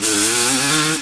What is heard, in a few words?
Mid, Engine